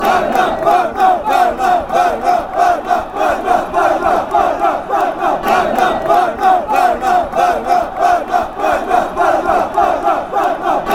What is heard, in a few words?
parma fanchants